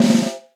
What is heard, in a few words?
Snare Press Steely Tight; Snare Articulation; Drum Hits; Session Drums Studio; Standard Hits